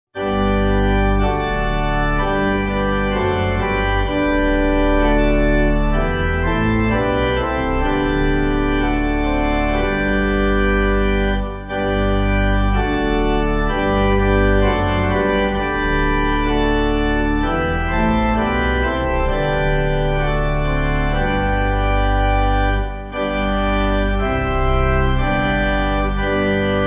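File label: G Pipe Organ; Master Speak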